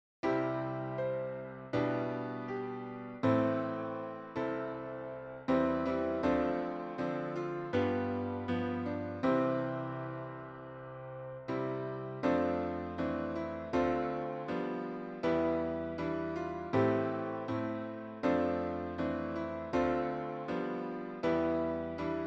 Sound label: Still Still Still Piano only; Summerwind Ward Choir Practice